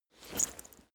leather metal movement soft single

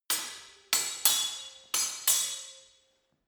sword hit fast sword sequence

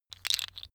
tiles wood

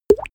glug

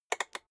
rotary control short quick turn hard

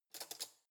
cutlery spoon drawer grab soft

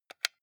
console controller xbox onoff press fast

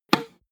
tennis ball hit

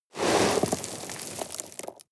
sweep small rocks

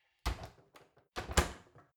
oven close fumble

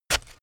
shovel stab

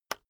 keyboard external enter hit release

